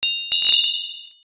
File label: shrine bell